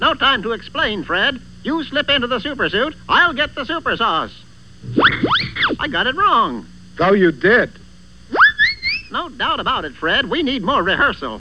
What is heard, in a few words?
rehersal